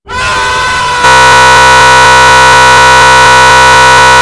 falling error; kirby

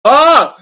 pain

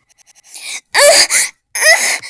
ahhh ahhhh

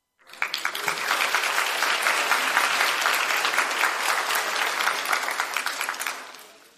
moderate clapping

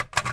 pick nes gamepad

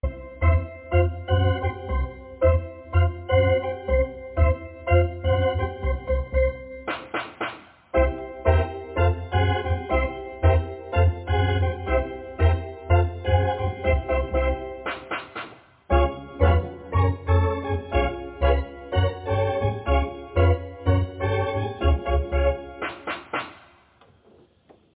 buildup

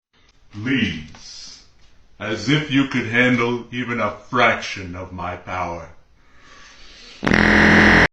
power fart

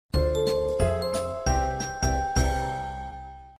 instrumental

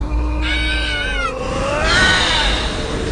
ice scream jumpscare

